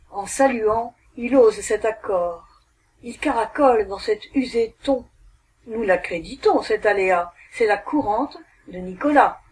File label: Courante